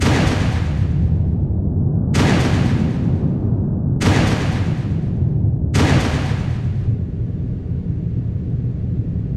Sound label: bam bam bam bam; bobby